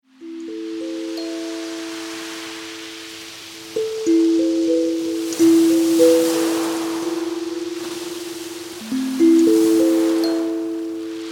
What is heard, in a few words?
Bebe kalimba